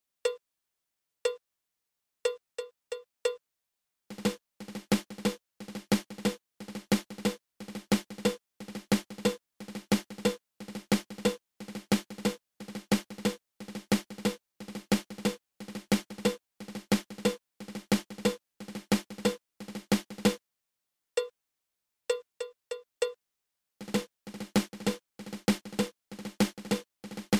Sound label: Double Drag Tap